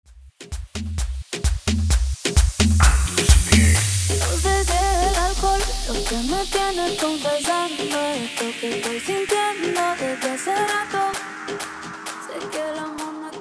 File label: Rico Remix 130 bpm